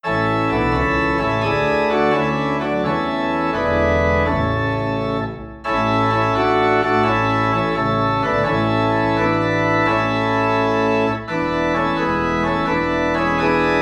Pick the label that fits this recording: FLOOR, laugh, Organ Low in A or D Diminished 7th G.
Organ Low in A